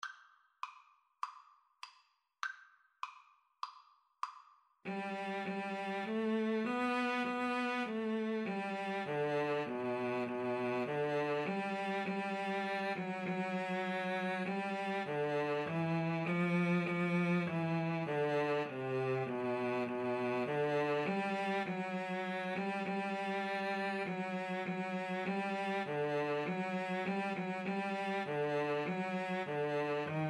Joyful, Joyful, We Adore You for Violin-Cello Duet